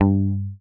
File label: HOFNER MUTE, Bass